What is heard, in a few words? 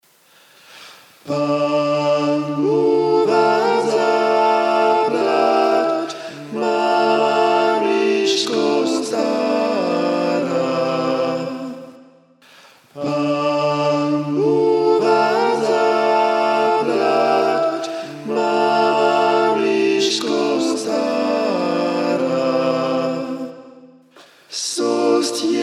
tutti; pan buh vamzaplaf